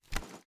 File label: inspect; gmod